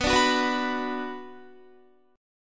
B Minor, Guitar; strummed

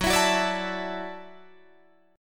Gb Minor 7th Sharp 5th, Guitar Drop D

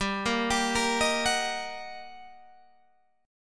G Minor Major 7th Sharp 5th G, Guitar Open G; chordshape; down

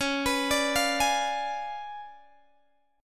C sharp 7th Flat 9th Guitar Open G, chord

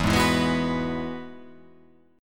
E Major Add 9th

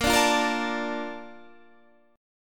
Bb Major 7th, Guitar, Half Step Down

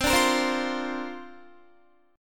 C 7th Suspended 2nd Suspended 4th, Guitar; C7sus2sus4 strummed

